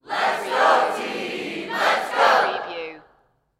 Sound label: Sports Crowd Cheerleaders Chant Lets Go Team Lets Go Single